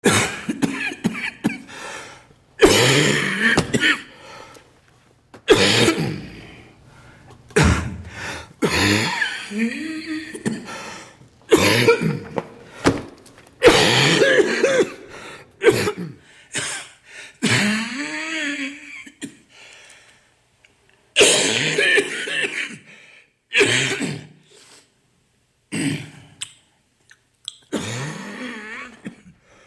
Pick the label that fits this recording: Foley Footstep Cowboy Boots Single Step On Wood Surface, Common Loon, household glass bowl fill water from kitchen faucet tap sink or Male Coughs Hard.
Male Coughs Hard